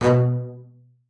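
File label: marcato B2 left, bass spiccato; Xsample VOL-5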